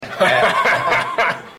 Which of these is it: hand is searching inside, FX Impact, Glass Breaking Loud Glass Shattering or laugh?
laugh